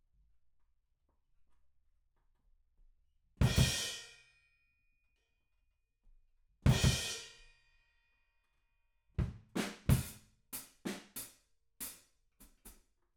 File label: Room